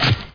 impact

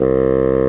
bassoon; Sound Library 2000; Wayzata Technology

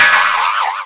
shot

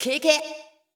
VOICE GRL HOUSE 125 A, House Voice

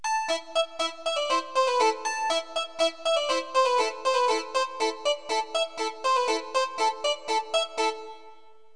harps; Roland; An Invitation To The Roland World Of Music

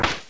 hard Hit

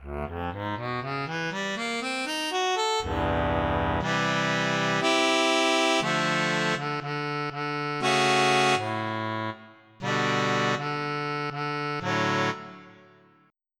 Harmonica; FF7

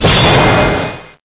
Metal Pound; CYBER

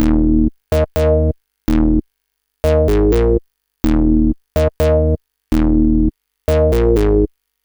BASS; Evolution

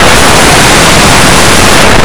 drawer; Blue Heat

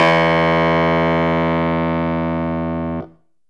Clavinet E3, Vintage Keys; Future Music

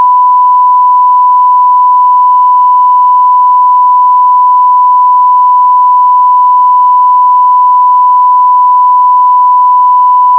tone; Key Multimedia Greetings; SoftKey 1997

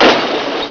Autocrash; Tron 95

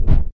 flap

